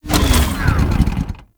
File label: Dismount, Motorcycle; Warframe; Vehicles; Motorcycle Ninety Nine